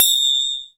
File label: BELL, perc; Zero-G; Funky Elements